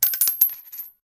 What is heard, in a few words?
brass, weapons